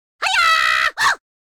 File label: cartoon yell; NCH Library